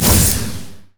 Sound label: dragon strike; LoL; jarvaniv lubu